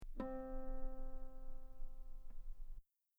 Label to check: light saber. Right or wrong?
Wrong